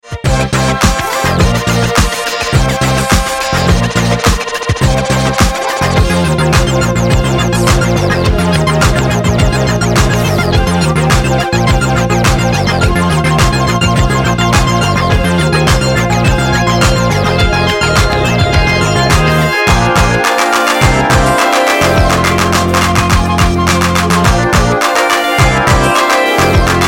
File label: Instrumental Loop